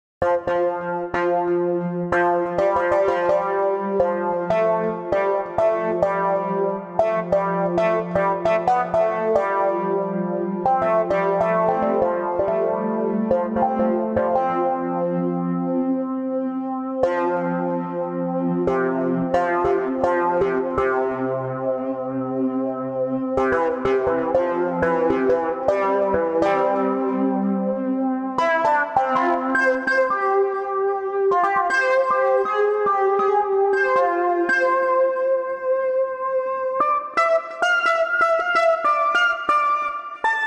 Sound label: String Studio VS3